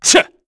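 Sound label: Vox Jump, korean; voices; heroes; Lusikiel; kingsraid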